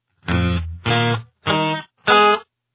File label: octaves, guitar